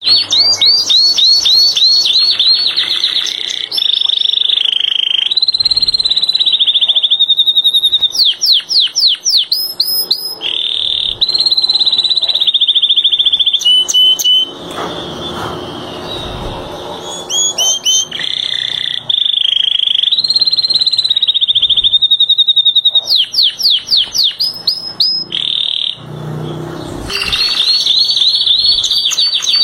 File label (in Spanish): ringtone pajarito